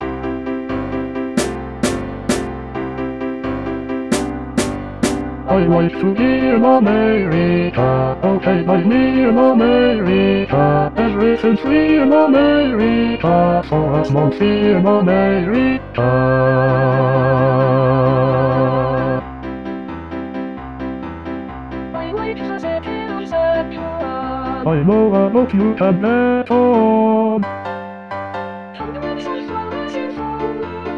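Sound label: America Bass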